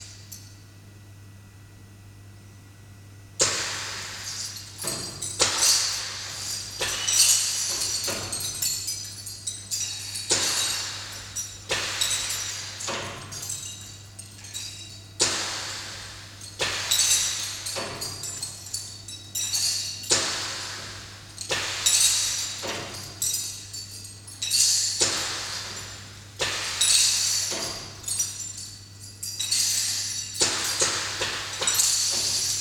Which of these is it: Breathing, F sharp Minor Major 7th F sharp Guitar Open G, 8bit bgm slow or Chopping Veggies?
Breathing